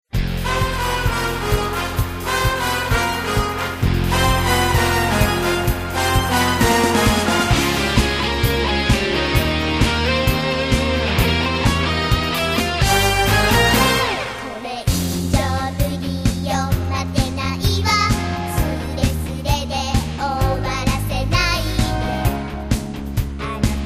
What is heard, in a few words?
Full Orchestral Medley